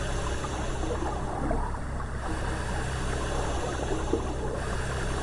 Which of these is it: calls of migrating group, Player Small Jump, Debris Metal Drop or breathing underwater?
breathing underwater